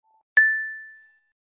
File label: Correct Sound Effect